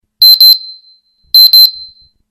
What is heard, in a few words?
bip bip Msg